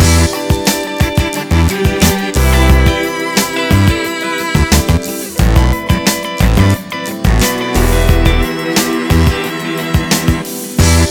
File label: Instrumental